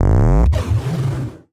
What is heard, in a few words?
Title Transition Evolver Short; Production Element